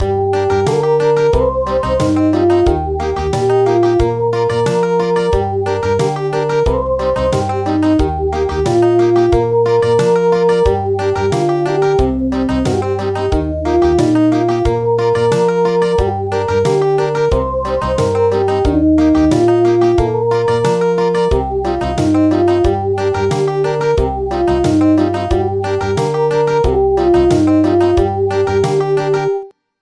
Thai lullaby Country

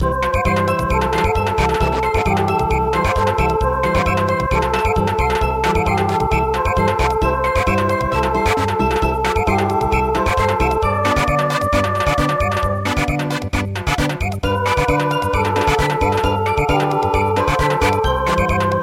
Samba

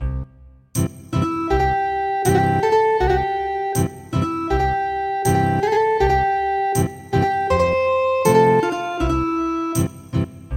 Ethnic Guitar

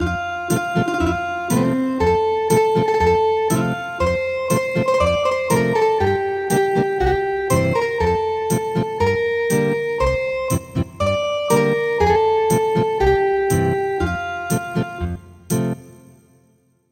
Ethnic Guitar

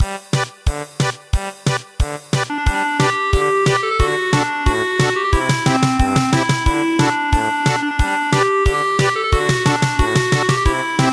German Polka

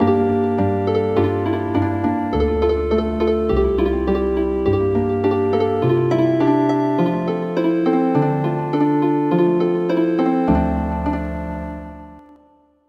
Healin Harp

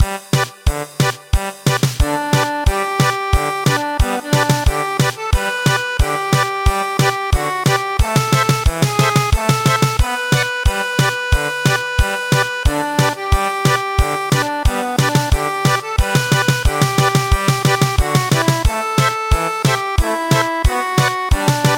German Polka

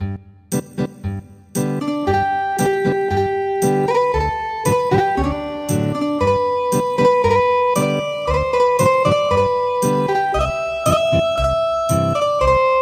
Ethnic Guitar, traditional

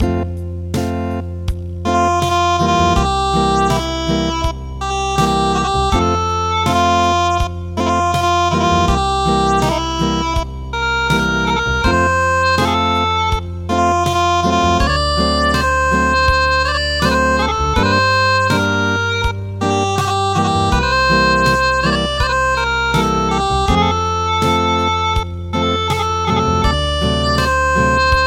Acoustic

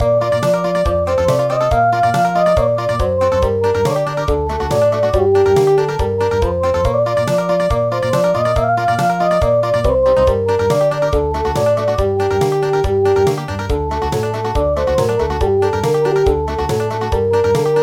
Country; Ukraine has not yet perished

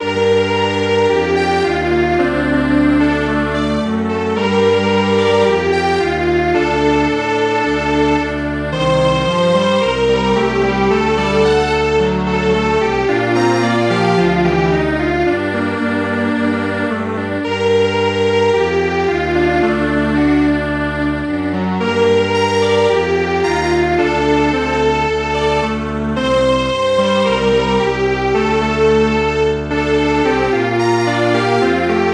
Strings Quartet

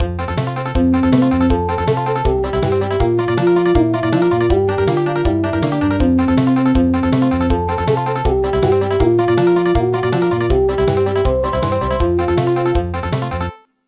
Country

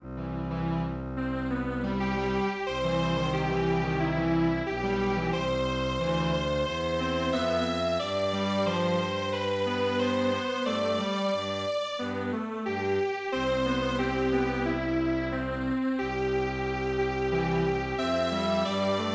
Strings Quartet; ADVANCE AUSTRALIA FAIR

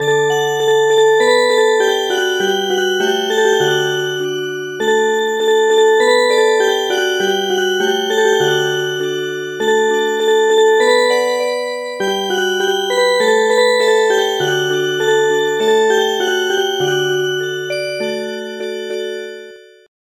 Music Box; traditional; Many Years

